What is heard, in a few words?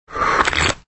Sip Sound